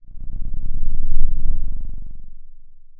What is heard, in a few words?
pop